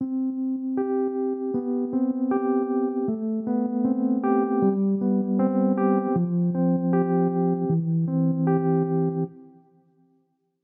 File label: simmersprings bgm head 78bpm CMaj 12beats